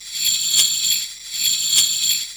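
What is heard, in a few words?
tambourine, drums; CMP - Analogon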